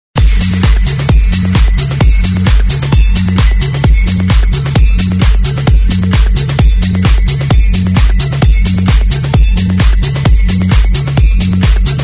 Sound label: kick; multitrax